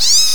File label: snake die; Giza